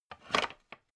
pan stack

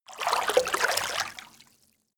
water light

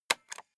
stapler staple loose

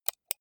computer mouse right click

